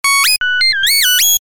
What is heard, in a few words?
science fiction computer glitch or malfunction